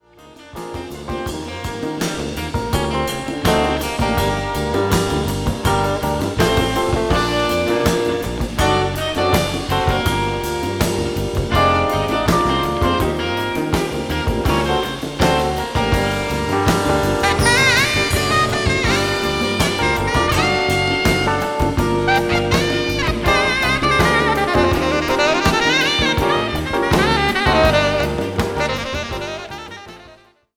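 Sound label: jazz fusion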